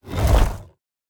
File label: swing; smite; geb